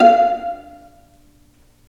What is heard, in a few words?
Vas Pizz; STR Vlas Pizz